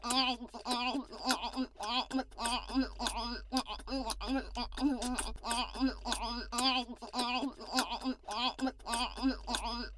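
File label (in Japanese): フェラ音（激しめ）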